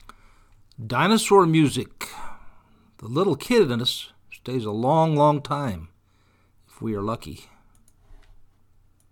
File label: Dinosaur Music